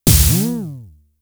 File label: Snare, Drum Machines; Fricke Schlagzwerg